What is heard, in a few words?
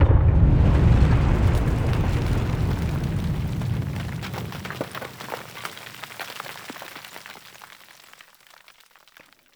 Battle Ambient Tunnel Rumble; Warframe; Tau